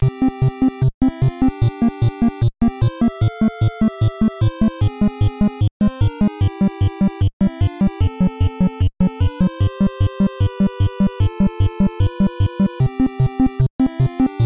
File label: Congratulations!; pro wrestling; nes